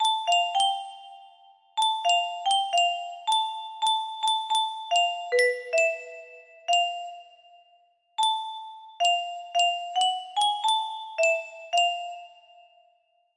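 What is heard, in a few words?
music box melody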